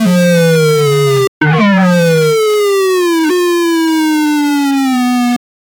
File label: warping electricity aroun